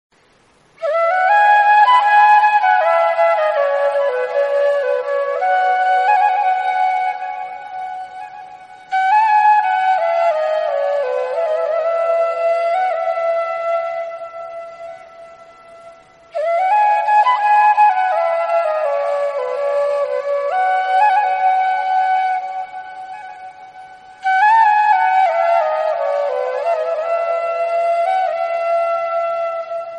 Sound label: flute